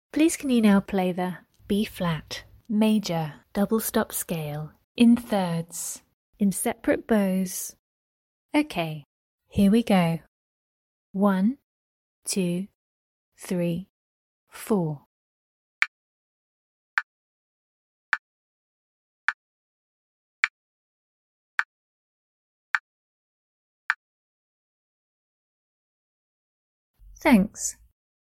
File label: b flat maj double stop scale 3rds sep bows